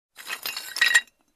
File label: Sweeping up shards